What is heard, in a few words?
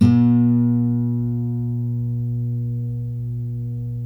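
GUILD 12-string, Guitars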